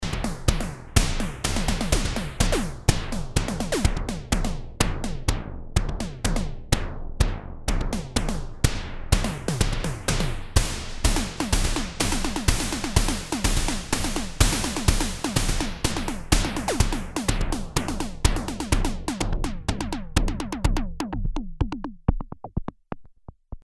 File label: drums, Roland alpha juno synth